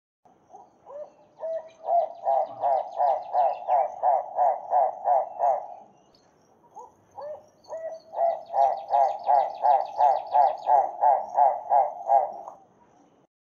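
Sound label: Livingstone's turaco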